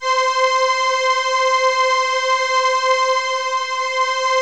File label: Crumar; Masterbits - Vintage Voltage; CRUMAR PERF